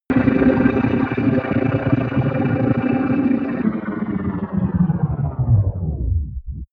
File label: promo pad